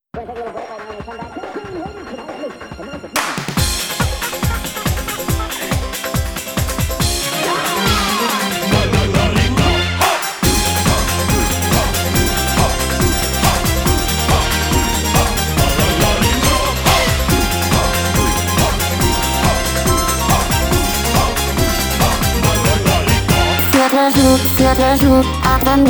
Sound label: Balalaika (Russian ver.); Meri RUSβ; naviloid